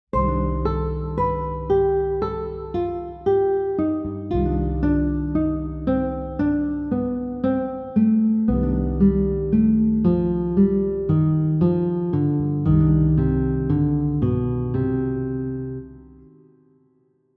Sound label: Desc 3rds pattern mel minor, guitar